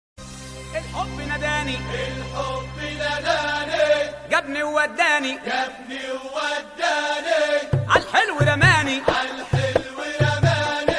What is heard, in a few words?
MUSIC SOLO ORIENTAL